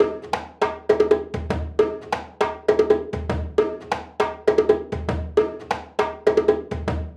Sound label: 134, Djembe loop drum Percussion, hits; The Gambia sessions